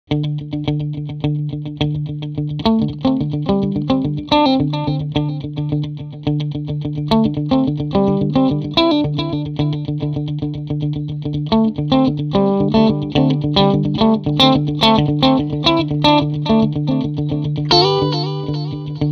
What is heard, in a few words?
Modulated Echo